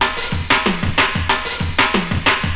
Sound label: DRMROLL; E-Lab; X Static Goldmine 2; akai